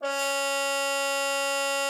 CUP MUTE, C#4; Xsample VOL-3

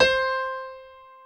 PIA C 5; Best Service Gigapack 1

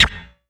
Yamaha CS1x Drums

